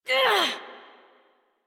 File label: Struggle Short Adult, Efforts; Warframe; Duviri; Drifter